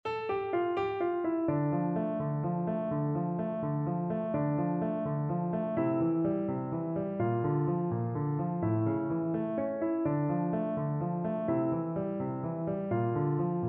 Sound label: horn, French Horn